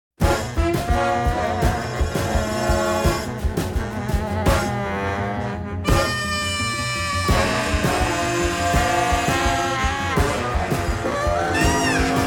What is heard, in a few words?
midtown instrumental